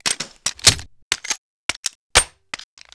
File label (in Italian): ricarica, armi